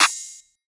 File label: Clap; Sevn